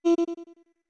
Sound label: Notes Voice F short; OOT